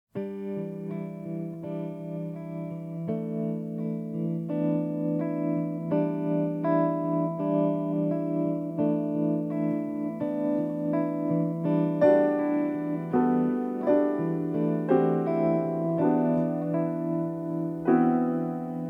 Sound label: quintet